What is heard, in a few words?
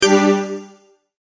Multimedia Tone-Stop